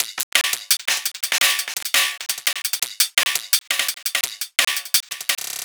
Filter Jungle Beat; KJ Sawka; Drum n Bass & Beyond

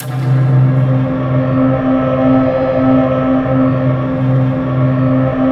pit hit; Spectrasonic Distorted Reality 1

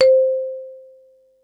mallets

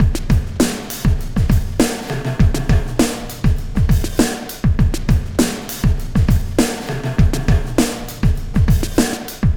Sky Lab Beat, Processed Drumkits

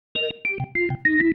Pattern, Organ

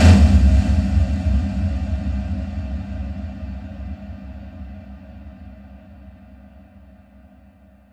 Boom; Sonic Foundry (Sony Creative Software); World Pop

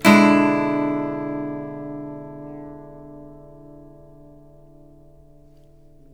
CHORDS, Blues Guitar; ILIO; Fretworks

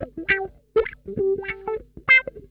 HIDE N SEEK, Funk Guitar; 90 s Sample CDs; Zero G

